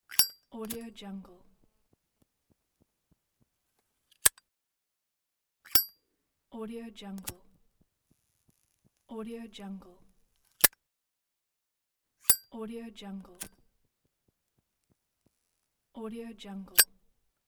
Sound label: lighter